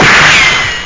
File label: gunfire